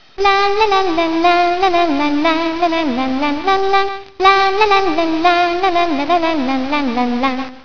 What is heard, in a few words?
lalalala